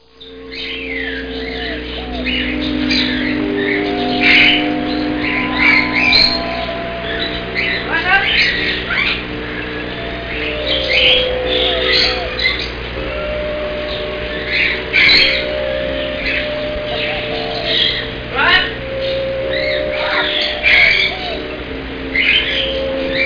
Birds; Easy Personal Web Page

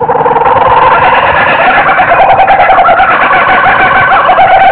Kookaburra; Animaux

